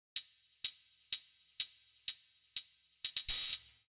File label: hihat 8 beats